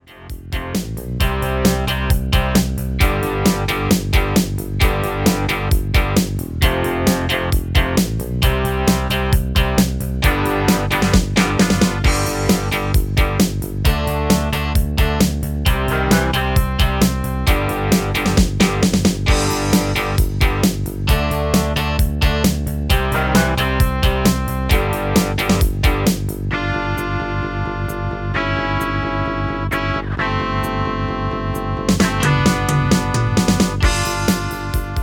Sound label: MIDI